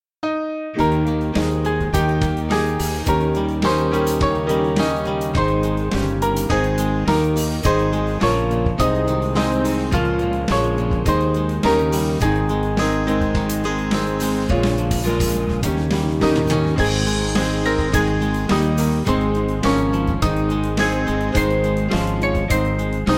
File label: Ye Righteous, Band; Frances